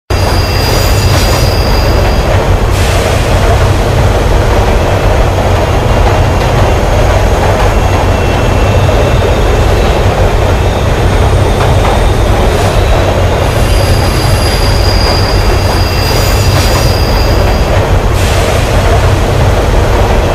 Subway train

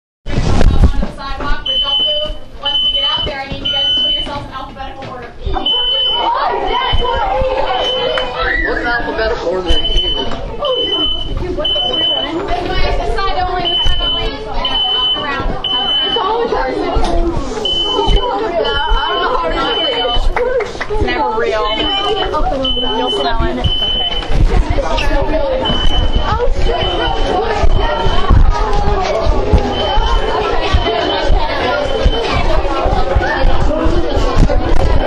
Middle School Fire Drill

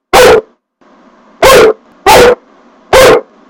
Dog Barking 4 Times

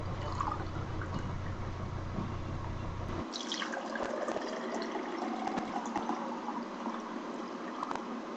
Water pouring

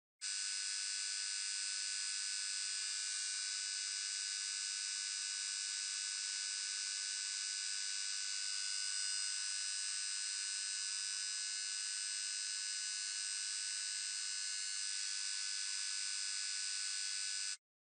Light buzzing fluorescent light

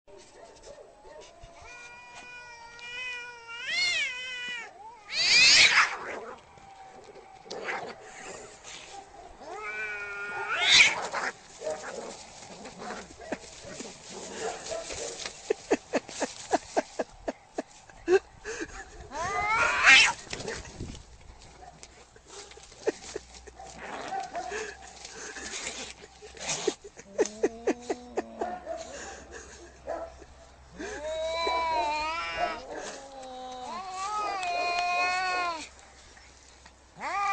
angry cat

